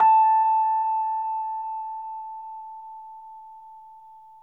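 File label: Rhodes, A4, left; 3D Sound; 3D Keys VOL-1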